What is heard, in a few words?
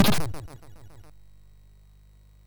SFX, MOS6581; Narco Police